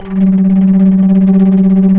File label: FLT Bass Flute, Flutter; Roland LCDP04 Orchestral Winds; 90 s Sample CDs